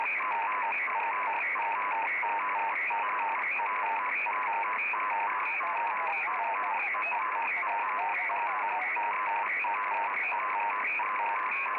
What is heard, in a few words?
HF noise